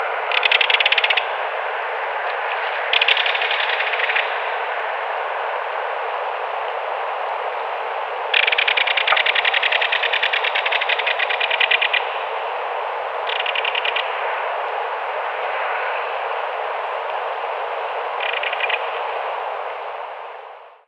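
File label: call, belted kingfisher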